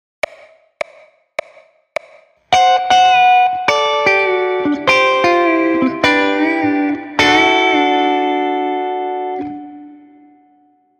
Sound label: pedal steel precision